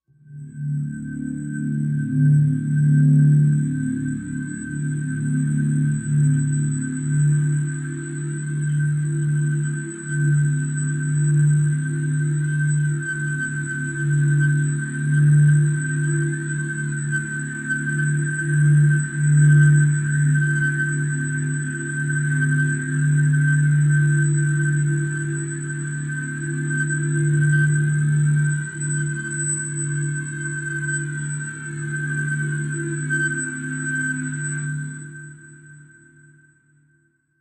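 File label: Slow High Flange, eerie ambience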